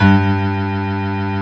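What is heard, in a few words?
reverse piano G2; AKAI S-Series CD-ROM Sound Library VOL-2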